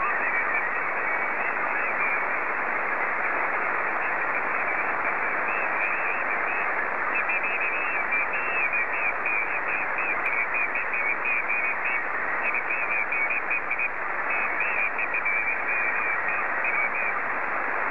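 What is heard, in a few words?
PD50, slow-scan television; KB9CJV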